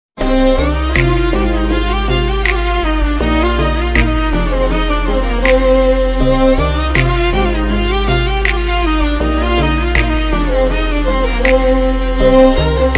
instrumental love ringtone instrumental tamil love sad ringtone